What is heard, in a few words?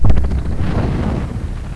tank sh